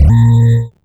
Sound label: Talkin Bass A sharp